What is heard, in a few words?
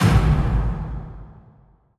Epic Drum